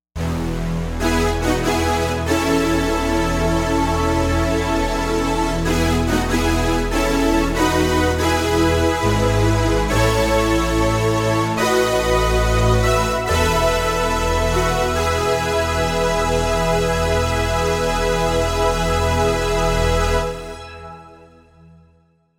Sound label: brass hold sweep; jupiter-80